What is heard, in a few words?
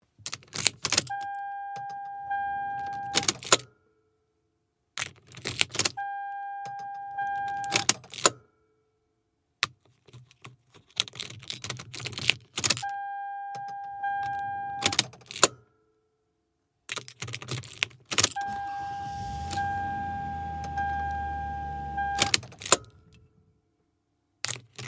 car switch lever